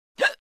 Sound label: Woman short hiccuping